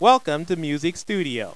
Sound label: music